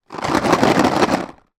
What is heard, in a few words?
food chocolate in box lid closed shake; maltesers; zapsplat